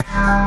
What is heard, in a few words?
G STAB, funky